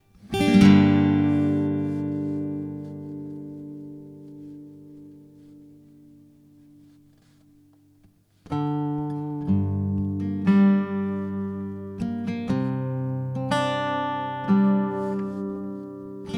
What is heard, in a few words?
Gm120 GTR chords